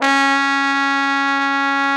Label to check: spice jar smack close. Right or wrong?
Wrong